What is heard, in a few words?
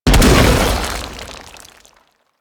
Infested Bone Blade Mortar Proj Impact; Warframe